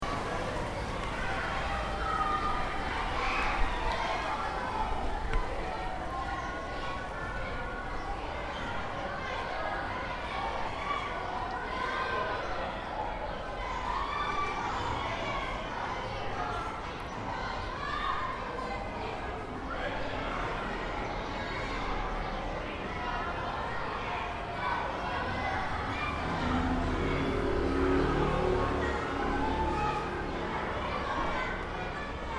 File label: City Childrens Playground, School back yard in centre of Athens, Greece; Many kids shouting and playing, some traffic passing by; City Sound Effects